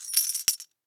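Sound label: Monetka, coin; UltimateFishing2020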